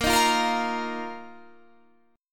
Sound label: A sharp Suspended 4th Guitar Standard; strummed; A#sus4; JGuitar